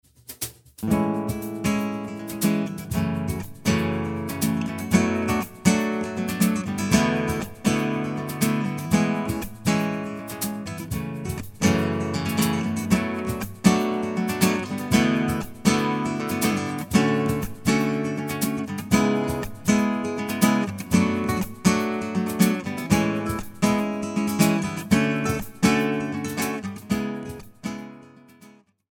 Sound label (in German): Konzertgitarre Flamenco; Easypick EP 1; ohne EQ Stereo